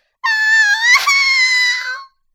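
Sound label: pain critical death; Suika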